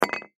Boat Hitch Hit, Boat Hitch Hit Settle Short Cement; Foley; Blastwave